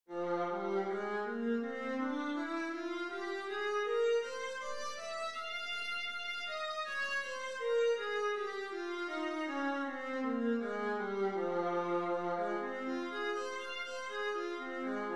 viola f minor, scales

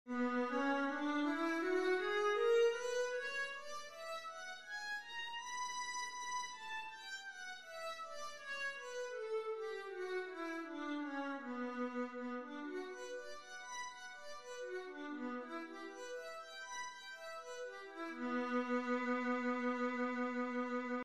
viola b minor, scales

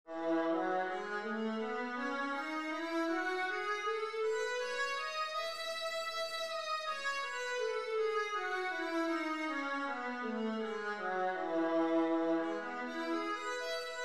viola e major, scales

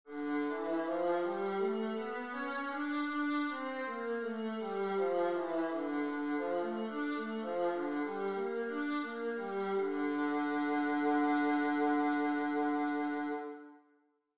viola d minor, scales

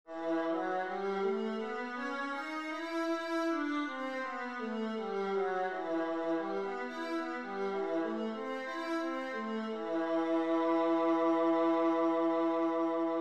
viola E minor; scales

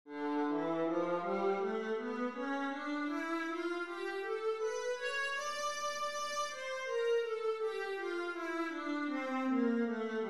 viola d minor, scales

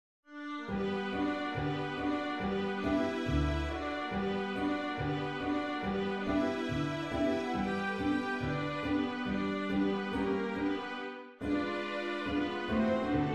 ragtime full

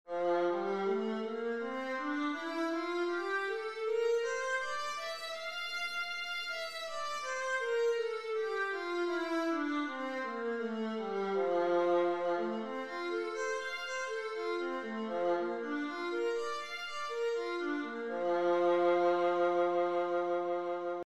viola f major, scales

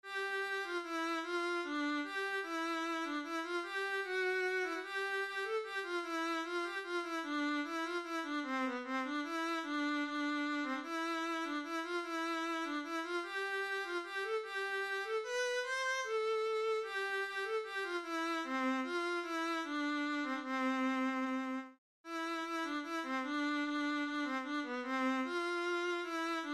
Kyrie viola

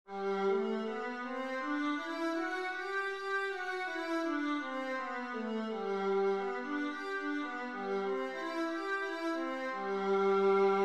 viola g major, scales

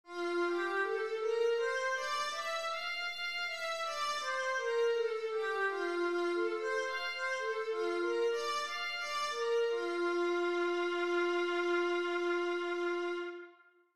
viola f major, scales

